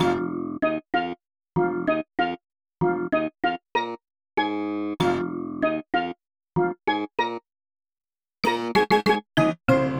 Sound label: Timecode, Hip-Hop, 96